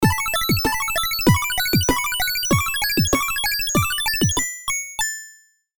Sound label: Retro game arcade level complete you win; level up